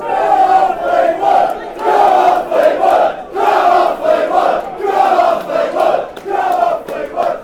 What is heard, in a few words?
come, chants; fleetwood